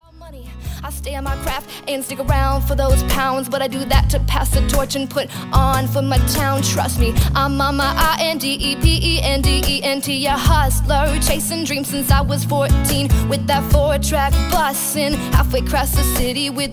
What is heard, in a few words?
Acoustic Cover